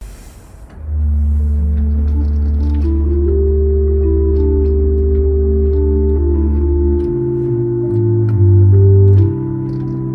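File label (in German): Kliemt 7 II P, pfeifenorgeln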